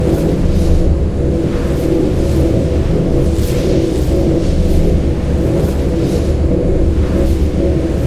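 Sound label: center relic buff damage loop; odin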